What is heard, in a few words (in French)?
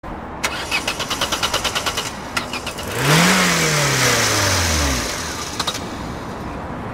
Démarre la voiture